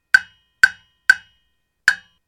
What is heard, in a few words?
jam block studio sample; percussion loop